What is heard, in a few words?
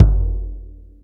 BD, Bassdrums, Roland TD-20